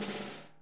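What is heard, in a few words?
snare buzz; Sonic Reality; Interactive Drum Kits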